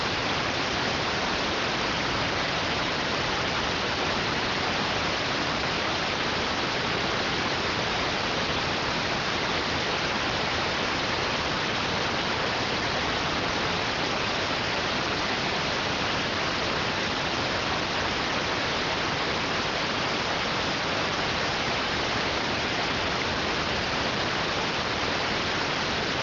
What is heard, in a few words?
rtty rx